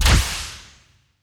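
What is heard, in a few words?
test, plasma weapon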